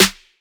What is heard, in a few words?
snare fat; Hip-Hop; Drums; Drum One Shots; Slate; CAICOS Hip-Hop Sample Pack